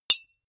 deodorant hit, metal; maschinen; soundpool

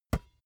basketball catch

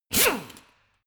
impactor short small; maschinen; bohrer

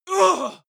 male combat hit soft; soundpool

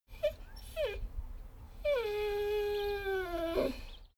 dogo argentino whine whimper; natur-tiere; hunde

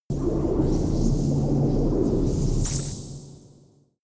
alien cosmic birds machines high; aliens

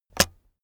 keyboard external space release heavy; kommunikation

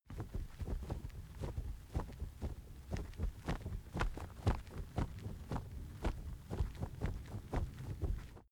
wing flap leather soft; natur-tiere; voegel

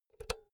power cord insert hard; objekte; elektronik

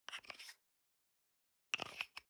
toothpaste screw cup close medium single; haus-garten; badezimmer

